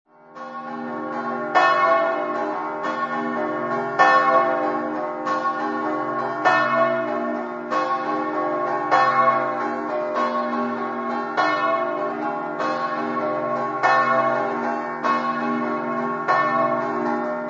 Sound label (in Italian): resia, campane